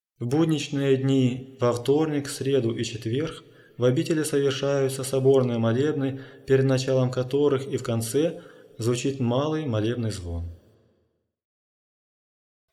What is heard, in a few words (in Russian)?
МАЛЫЙ МОЛЕБНЫЙ ЗВОН